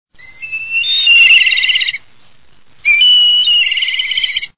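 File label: black kite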